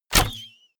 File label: bow shot